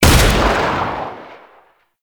Revolver Rifle Shot, Weapons; Warframe; Tenno; Mod Quest

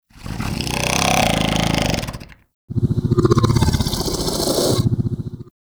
Undazoa Vocal Snore; Warframe; Hunting Critters; Characters

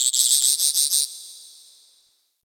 Ocean Critter Insect; Warframe; Grineer Ocean

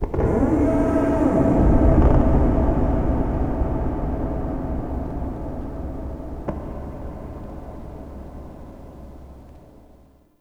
Dark Texture, Ambience; Warframe; Shadowgrapher

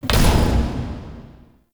UI Mod Dectivate Pilot Weapon; Warframe; Railjack; Player Ship

